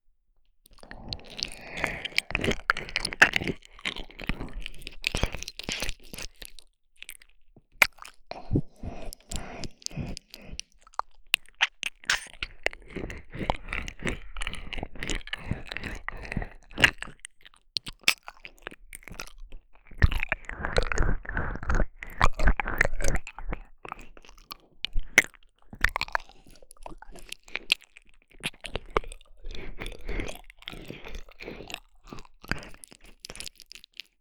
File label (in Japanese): 耳舐め安眠; 左耳var; 分岐